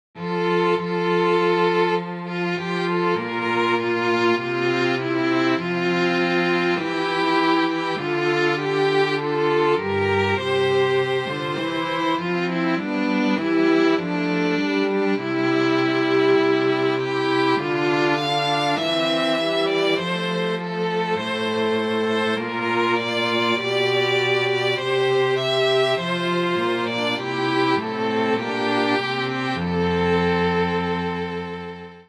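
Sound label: dance suite for string quartet; dj.b. vitali; tancevalnaya suita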